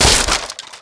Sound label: shield broken; Mount&Blade Warband